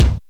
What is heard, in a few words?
thumpy kick single shot b key, steel kick drum tuned to the B note